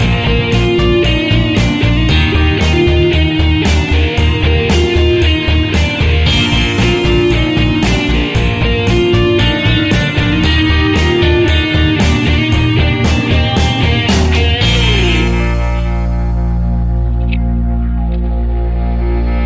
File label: Guitar Promotional Pop; bbc